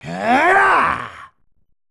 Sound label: kid death ball; buu